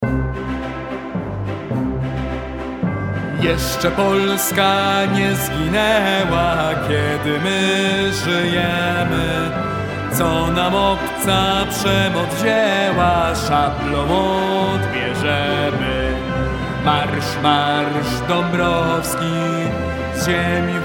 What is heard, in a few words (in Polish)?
mazurek dabrowskiego hymn polski krotka wersja wokalna